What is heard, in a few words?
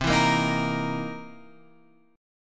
C 7th Sharp 9th, C, down, Guitar, Open G